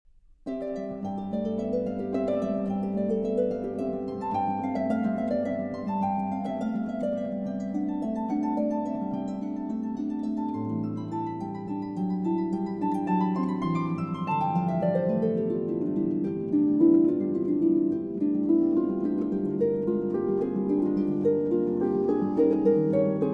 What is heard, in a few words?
Toccata; Rota Sarabanda e Toccata